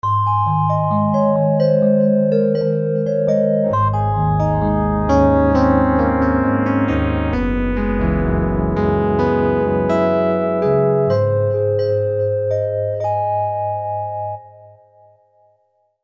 Tin E Piano; V-Synth

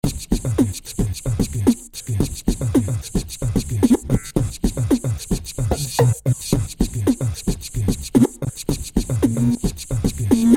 Vox Rhy Combo, v-synth

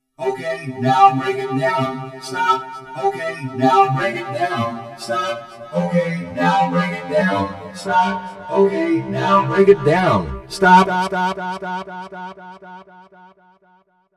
SBF Vocoder, V-Synth

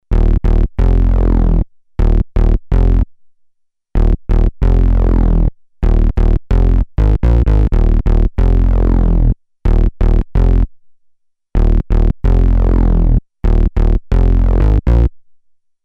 Dist Bs; BASS; sh-201

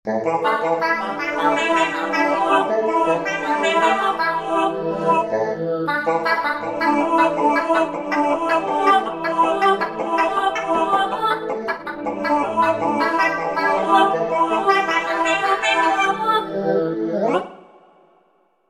Space Child, v-synth

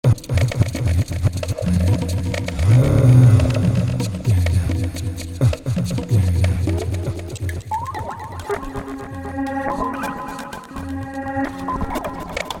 Dub voice; V-Synth

